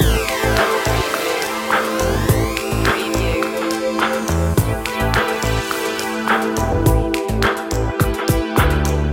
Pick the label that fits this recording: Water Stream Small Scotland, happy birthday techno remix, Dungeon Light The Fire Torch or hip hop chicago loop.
hip hop chicago loop